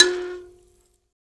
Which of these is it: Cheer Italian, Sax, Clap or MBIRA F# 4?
MBIRA F# 4